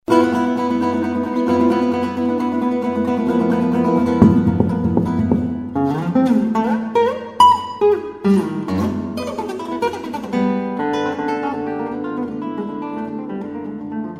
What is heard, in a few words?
GUITAR SONATA; GINASTERA